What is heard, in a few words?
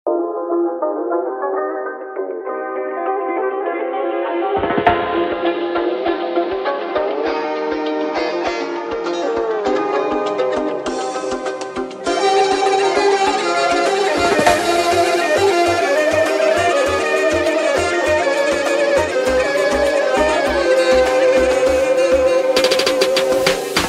kurdish remix